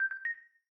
Video Record, ui

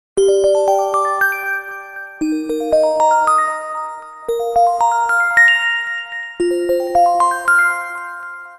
Ring Candygram, Windows Mobile ringtones; Microsoft

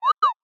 Slide Whistle, notifications; Samsung; Galaxy A56; Fun

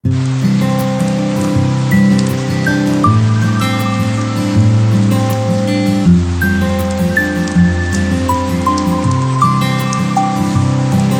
weather alarm rain; OnePlus; ui; OnePlus 11

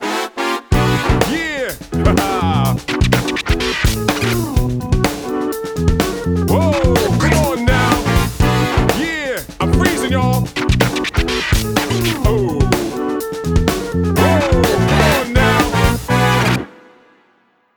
Streetball, ringtones; Xiaomi; Black Shark

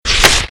open, folder; SV850 Wine 4; LG

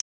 HW Touch; Samsung Galaxy-Watch-6

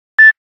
9210 Beep, Nokia Buzzer; Windows Phone apps

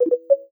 pull down, ui; Nextbit; Robin

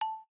camera focus, ui; Coolpad; Mega-2.5D

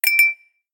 Ringtone

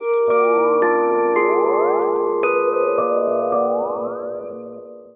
Eclectic; LG; P940-Prada-3.0

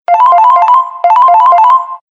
Fast Tone; Samsung SM-B690V Convoy 4

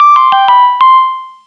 SMS; Samsung SGH-T369 Linx

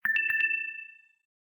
Low Battery; LG

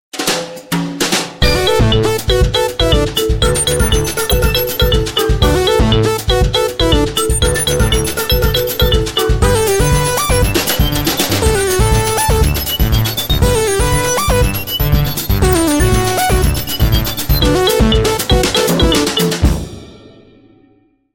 Happy Samba; Samsung SCH-U680 Convoy 3

